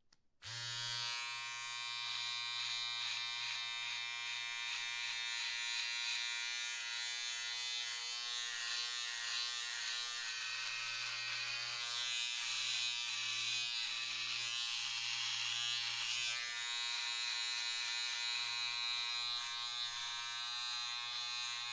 Electric Razor, Turn On, Shave Stubble, Turn Off; Household; Bathroom

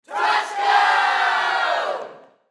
Human Crowd Studio; Crowd Reactions Touchdown Loud; Blastwave

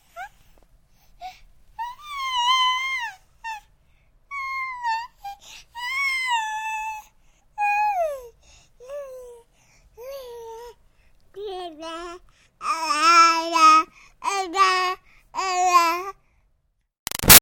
Baby 6 Month Old Talk Happy; Voice; Babies

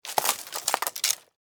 Impact Branch Tree Object Fall; Snap Sound Effects; Snaps (Sound Effects:Objects)

